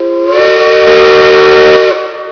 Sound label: 3 chime